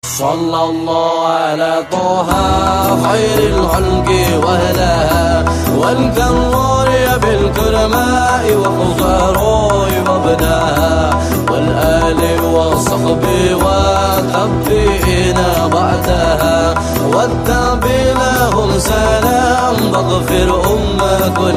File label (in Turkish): Islami zil sesleri